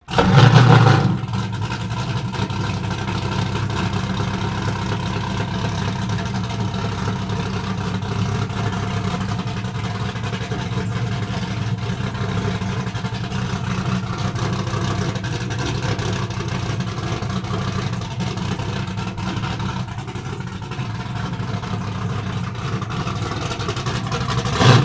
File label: the sound of big boat 2x900 hp